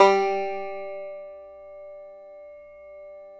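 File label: BANJO G2; Synclavier Sampler Library; KEYS AND GUITARS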